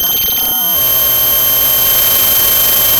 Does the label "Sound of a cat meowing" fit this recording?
Yes